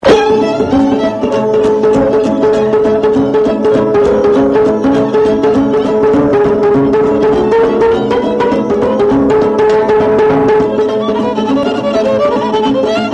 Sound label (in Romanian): Lelea alba taraf; jocuri si cantece batranesti; Vaslui